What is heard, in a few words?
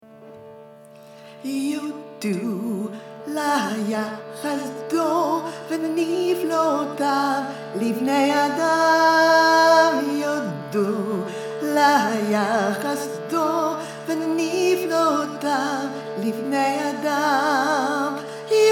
melody